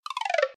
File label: drop Hole